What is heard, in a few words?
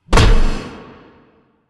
Bash Hit; Warframe; Warframes; Hoplite